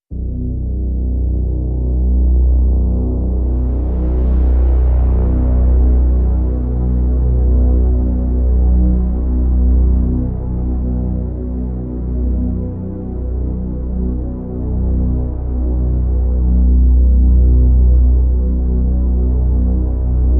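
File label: Drone Bottom Floor B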